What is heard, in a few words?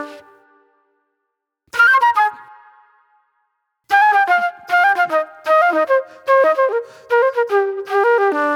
Raw Funk Flute